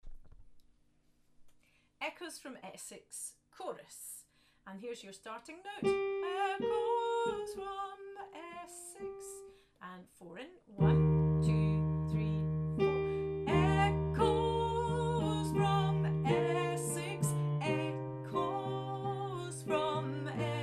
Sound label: CHORUS